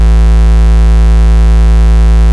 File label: Bass Root A